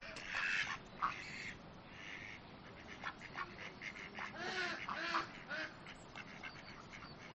urban farm hens ducks

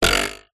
cartoon twang spring; zapsplat

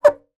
household finger squeak rub on polished table

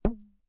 hair elastic band twang; foley

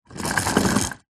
toy train wooden roll wood floor short

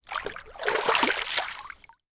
water splash or movement

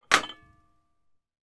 household cup or mug place down on metal tray

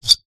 metal small clip slide squeak; foley

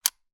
weapon gun ruger 10.22; still north media

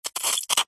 hospital syringe squirt thick gel like liquid

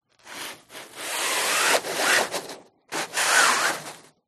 Blind Open

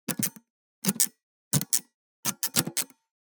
Levers Sliders; soundbits

